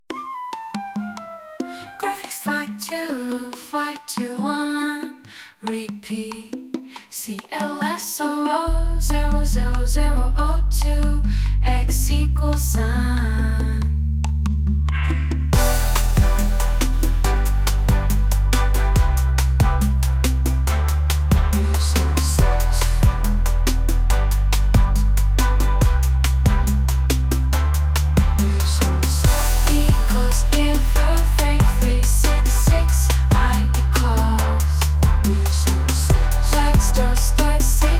Bossa

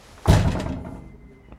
Bang

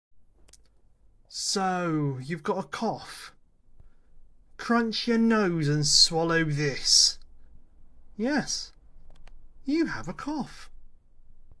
Cough Crunch

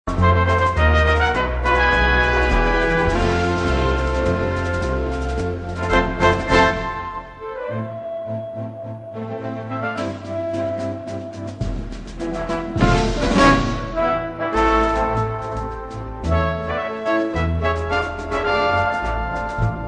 orchestral introduction; AI Test Kitchen; for socodernet

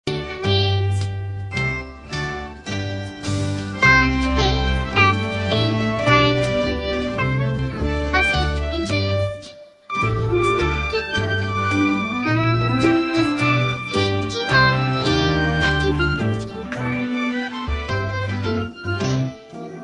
nursery rhyme the kitten hunted the mouse; AI Test Kitchen

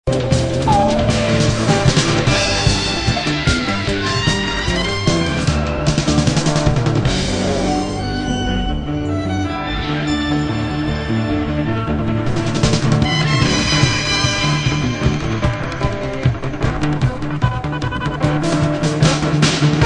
1980s gameshow titles spin the hairy dustmit; AI Test Kitchen

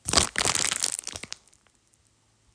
Crunch

